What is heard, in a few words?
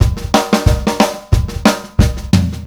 DRUM, COUNTRY; Best Service Advanced Media Trax Modern Composer